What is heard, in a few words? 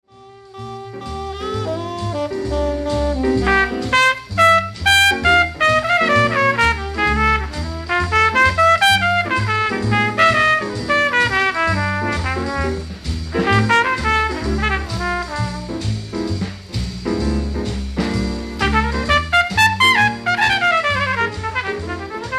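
trumpet solo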